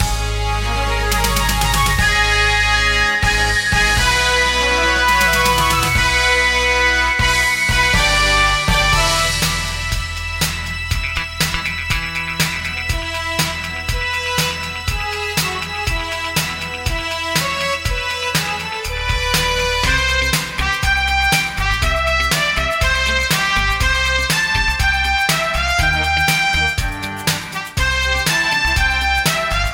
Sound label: GuitarMusic